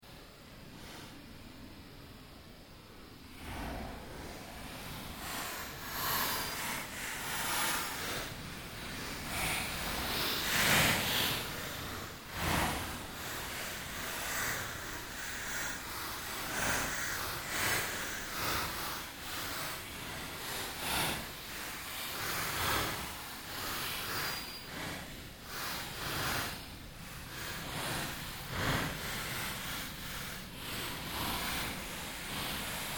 Created Ambience Fluctuation Loop, sound effects created by manipulating other sounds; Rise And Dive